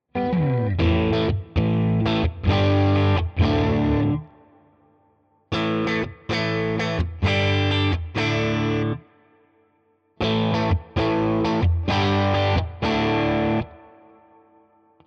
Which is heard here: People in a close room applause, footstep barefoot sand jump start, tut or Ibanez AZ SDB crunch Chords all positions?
Ibanez AZ SDB crunch Chords all positions